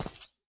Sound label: ceramic footstep; everness